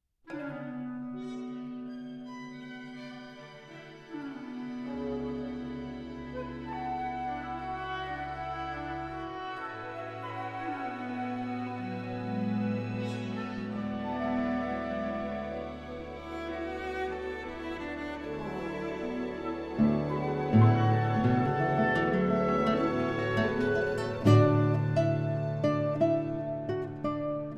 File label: Andante con moto with Cadenza